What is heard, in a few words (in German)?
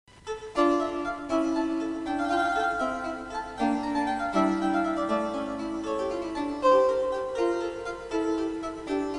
cembalo